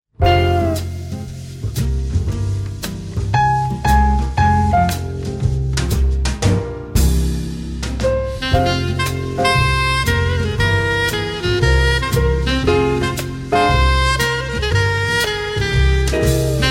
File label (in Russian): saksofon dzhaz fokstrot